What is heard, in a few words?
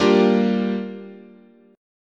G Minor 7th